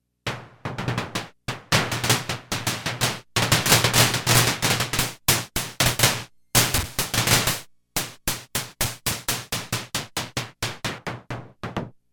Claps

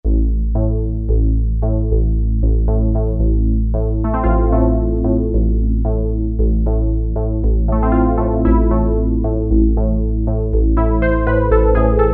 Dark Pluck, Roland Juno-106; Factory Preset

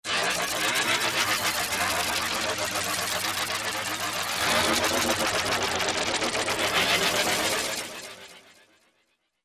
Iocust, Korg MSC-14S Sound Effects

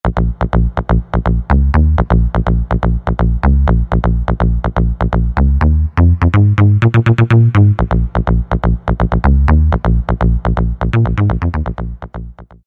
CRACK BASS; Best Service Alpha Dance II

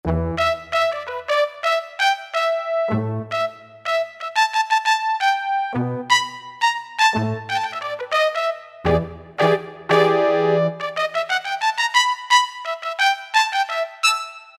Bones, Roland D-50; Factory preset demos

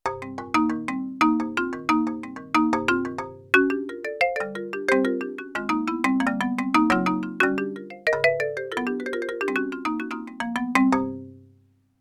Ethnic, Marimba, Yamaha TX16W

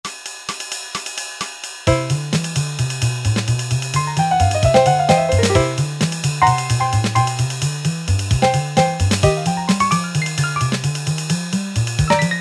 swing, Yamaha PSR-300 styles

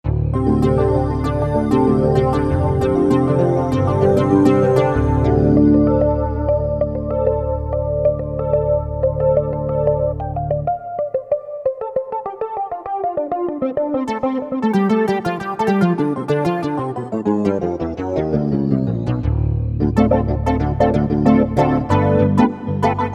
Harmonix+, Korg MSC-2S Synth combination; Synth 1

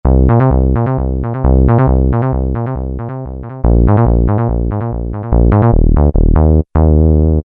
SH-101 Bs 2; Dance; Roland SR-JV80-06

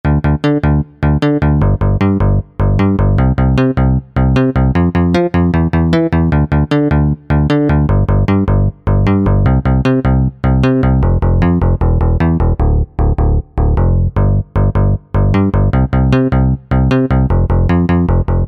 DX, E-mu Proteus VX

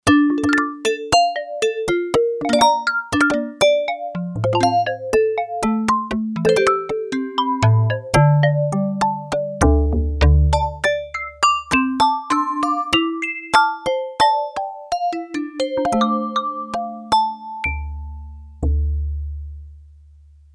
Magic Marimba, Yamaha TX802; Performances; Factory Patches

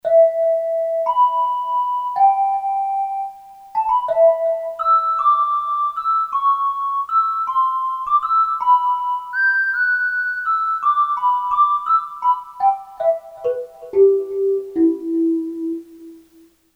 Pan Pipe, Korg DSS-1

